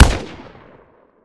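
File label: weapon ar fire player atmo exterior; gmod; viper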